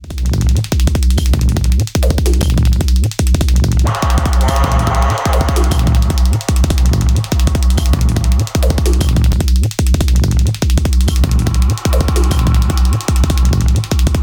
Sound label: Dubstepping Progression Fast